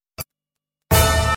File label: message alert; ringtones